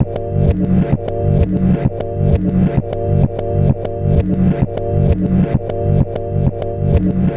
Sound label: Chd Fx Loop 130; Equipped Music; Loungin House